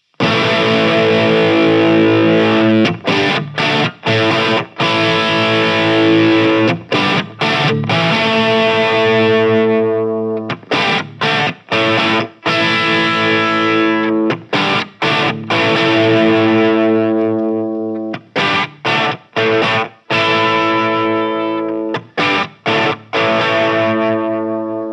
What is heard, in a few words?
Engl R606 Ironball Head 20 SE Crunch Volume Drive